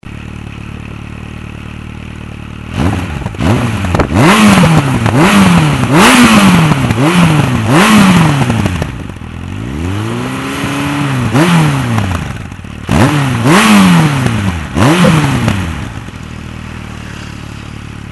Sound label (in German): Eigener XJR Sound; Samsung